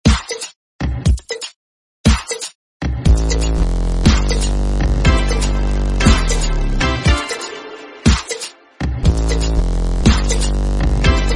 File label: Desi Vibez Music Ringtone